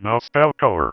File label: Female vocals and robo-vox